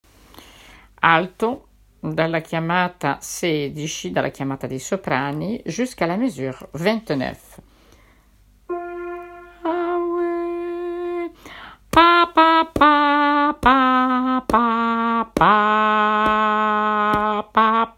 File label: alto lullaby